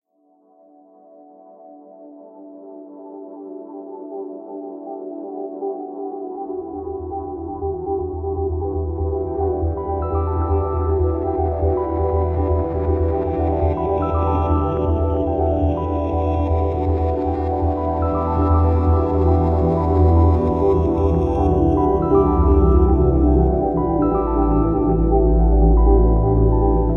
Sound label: Production Music; Flip Your Lid; Invicta Beam